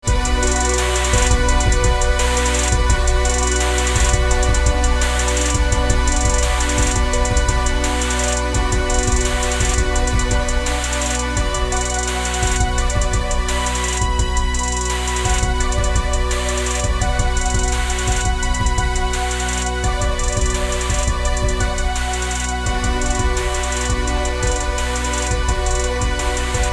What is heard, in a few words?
HipHipChill; Amper